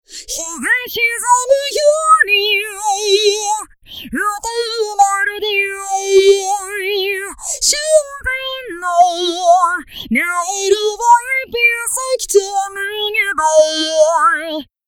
Phaser FB High

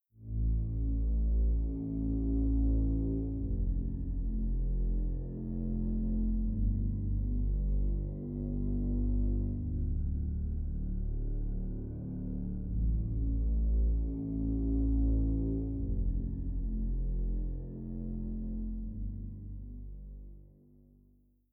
Future Bass Chord Pad Bass

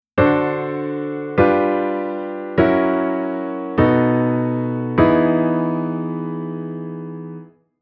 Chord Modulation Ext V7

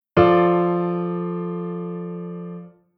Chord Compound

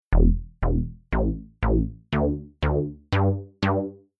Synth Env Bass

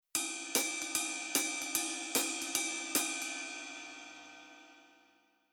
Rhythm Basic

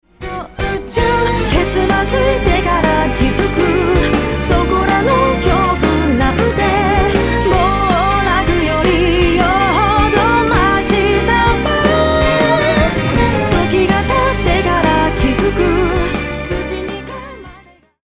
Strings

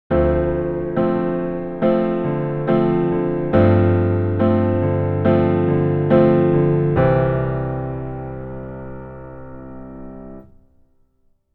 keyboard top note

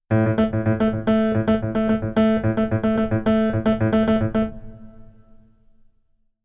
Keyboard

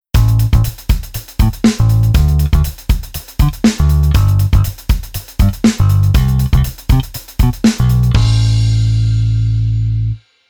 Bass Arr Oct

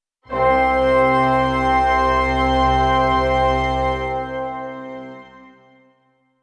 p longtone

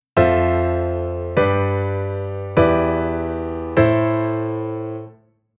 Chord Sec Dominant Pattern

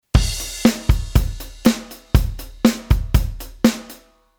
Quantize Rand; Midi Basic

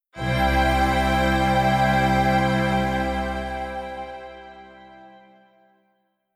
Strings Open

